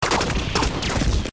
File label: bullet hit